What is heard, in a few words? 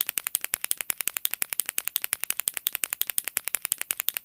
pen clicking; sorin